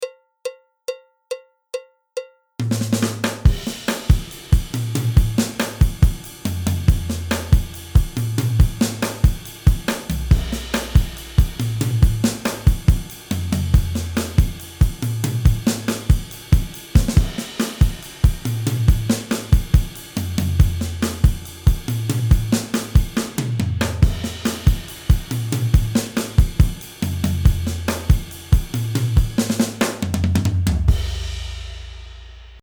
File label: boogalo beat, drums